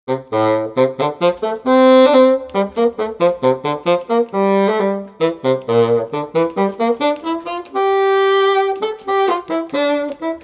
Fagot

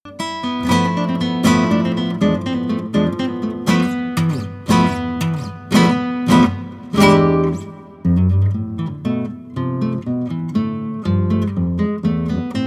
Gitara

